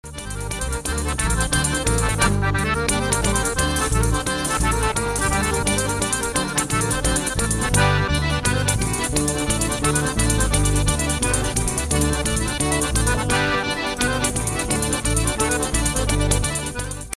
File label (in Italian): tarantella